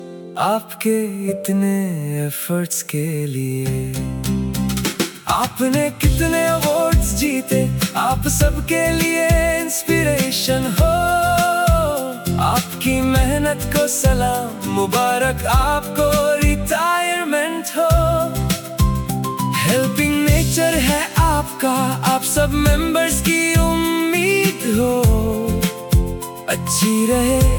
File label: Retirement Song; corporate